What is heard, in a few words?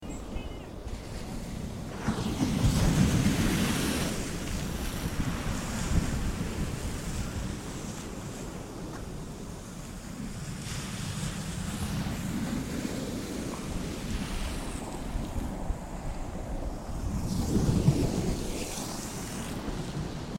seafront at high tide swansea